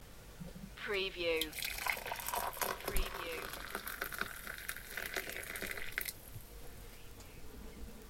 Boiling water pour; beanstalk audio

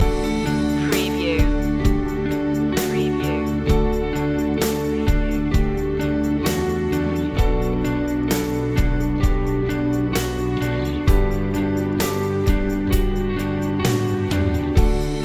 drifting loop

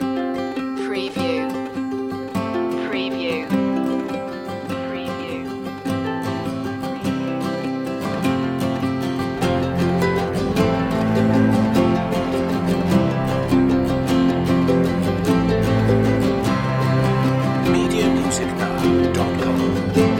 the nu-folk tune no vocal

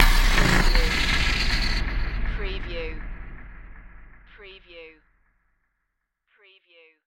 Hit Burst

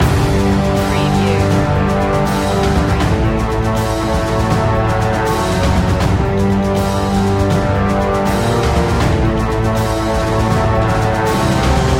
land loop

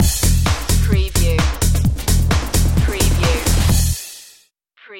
bleepshack

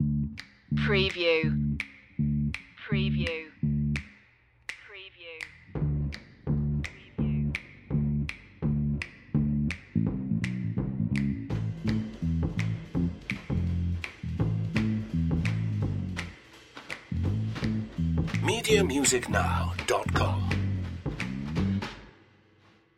fixin to score loop

